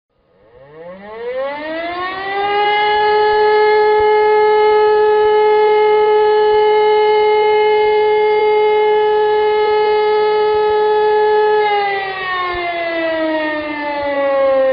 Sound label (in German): sirenen signale